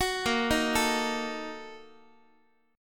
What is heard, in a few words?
A sharp Major 7th Suspended 4th Sharp 5th, Guitar; arpeggiated